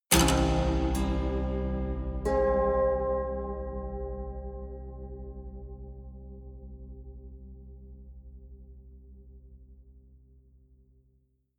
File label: haunted strings dark omen heavy